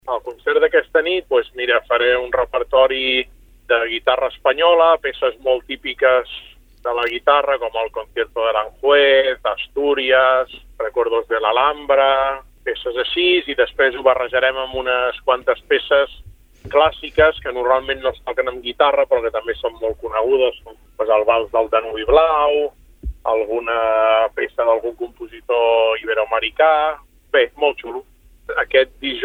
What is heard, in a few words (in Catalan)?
guitarra; nova radio lloret